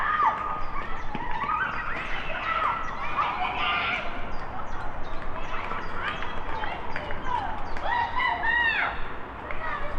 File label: screams